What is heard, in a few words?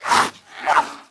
Mutant Attack Slash; Valleakan Mutant 2; Aliens